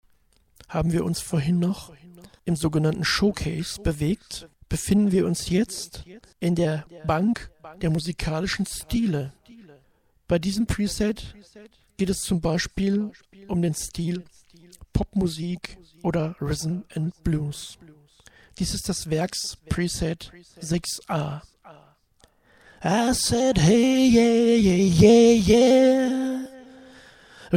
Popmusik

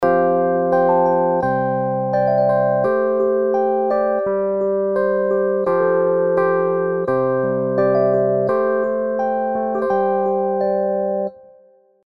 Stage 73 V2 unbearbeitet; Arturia

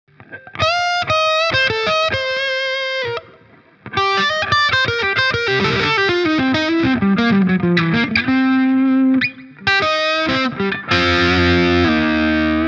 Gibson Les Paul Special verzerrt Hals

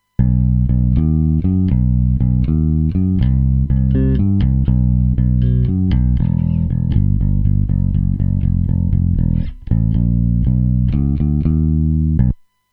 Bass Original

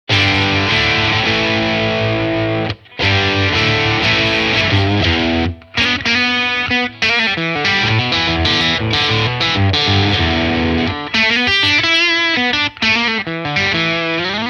T7 Steg angezerrt; Larry Carlton

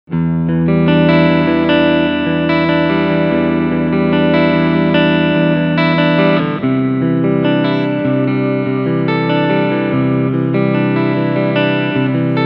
Picking Clean alle Pickups

